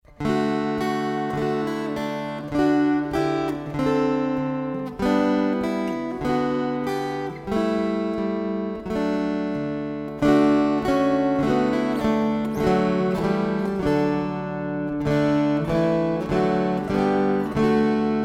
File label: String Choir